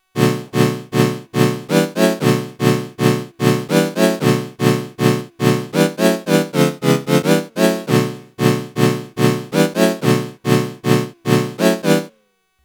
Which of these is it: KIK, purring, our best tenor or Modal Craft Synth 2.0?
Modal Craft Synth 2.0